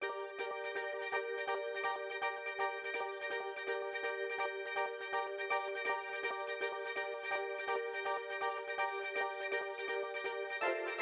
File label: bell pad C; Big Fish Audio; Legendary; Shes Mine